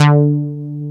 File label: OB8 SEQU, SYNTH; East West - Global Sounds; USA SYNTH